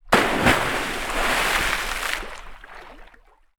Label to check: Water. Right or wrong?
Right